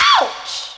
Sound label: VOC